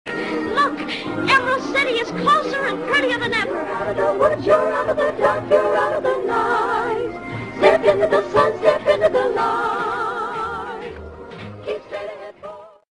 optimistic voices

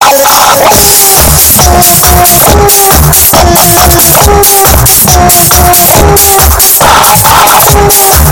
frog bass

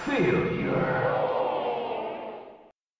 failure

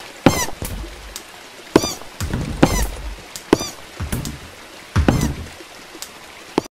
green footsteps; rainbow friends

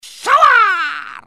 shower

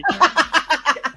ha ha ha

